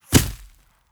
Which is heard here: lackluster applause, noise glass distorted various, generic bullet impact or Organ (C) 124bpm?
generic bullet impact